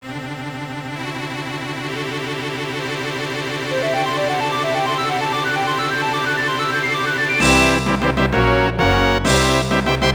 Boss Door Unlocked; Donkey Kong 64

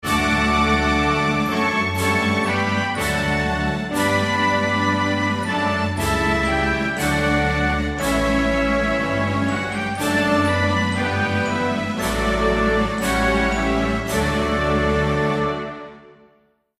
win jingle; Germany

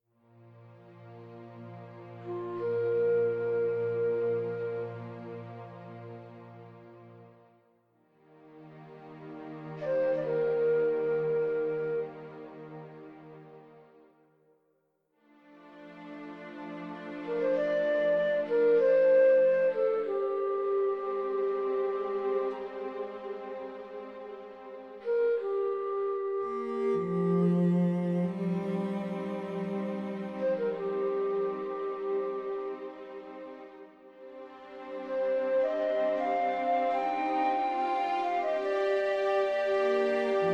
Forest Exploration; Banbas Forest; Curse of the Sea Rats

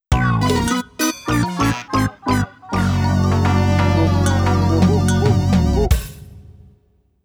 Low Placement Fanfare; Mario Kart Wii; Grand Prix & VS

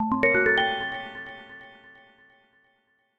Effect Puzzle solved; Shalnor Silverwind Saga

